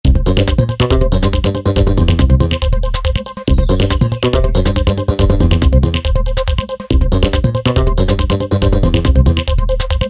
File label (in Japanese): コミカル